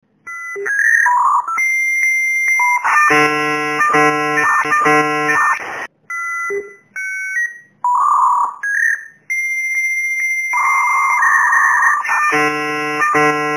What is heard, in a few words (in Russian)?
zvuk modema v internete; scenka na vypusknoj; shkola skvoz vremya